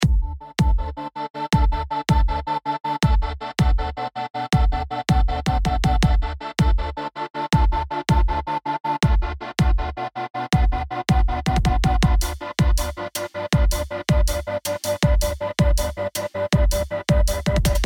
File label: drum and bass beat; weeklybeats-2014